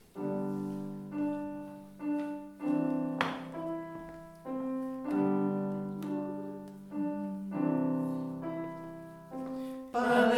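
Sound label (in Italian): Pane Per Noi Spezzato, tenori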